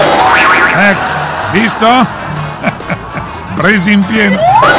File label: bodydrop